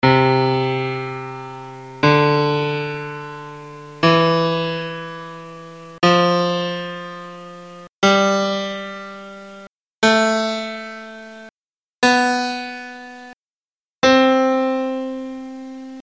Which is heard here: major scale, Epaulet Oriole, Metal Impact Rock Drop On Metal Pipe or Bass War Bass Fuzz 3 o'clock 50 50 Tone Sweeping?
major scale